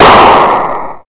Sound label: snap; quake2; coop